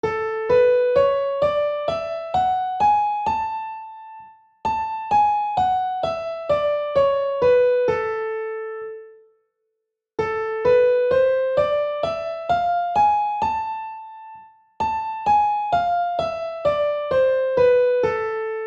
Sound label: scale example